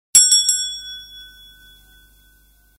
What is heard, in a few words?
bell